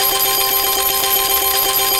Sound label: collect; Amatic; Lucky Little Devil